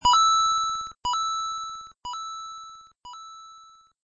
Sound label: coin diamond; smb